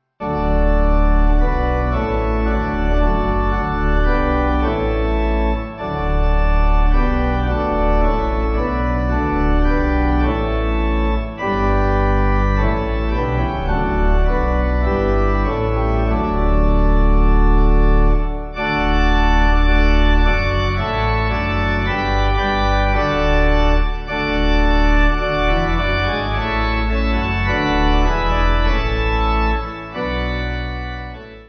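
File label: Pipe Organ, Music Backing Files for Churches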